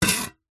industrial shovel spade metal short stab scrape on concrete; zapsplat